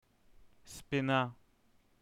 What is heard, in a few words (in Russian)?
gav